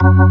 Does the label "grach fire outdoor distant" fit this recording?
No